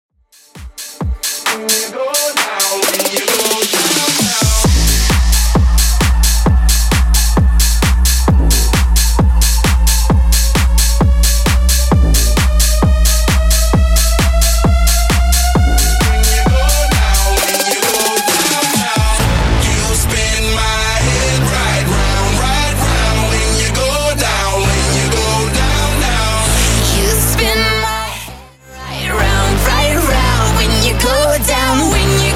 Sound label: Tribe House 132bpm